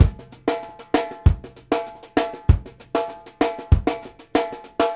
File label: UP BEAT, Drumworks; Sampleheads; New York City Drumworks VOL-1